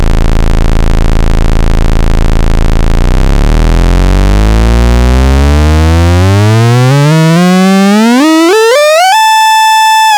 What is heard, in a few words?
SID pulse